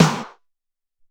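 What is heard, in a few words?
SR16 Kick, Alesis SR-18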